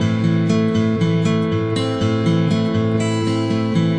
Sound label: acoustic guitar picking